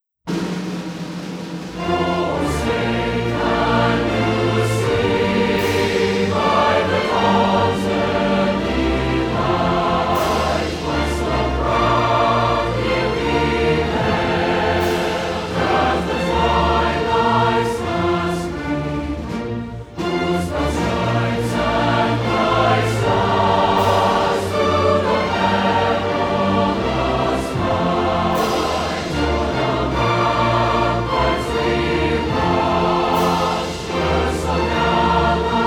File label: Band Choir & Orchestra; All-State Combined Performance Recording; National Anthem; MSHSAA MMEA